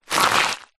foley shoe scrape fast gravel stones on road or path